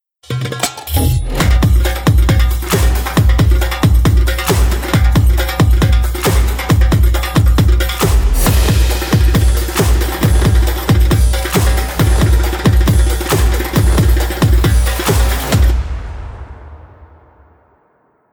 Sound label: Percussion